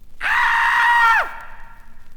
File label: one scream - male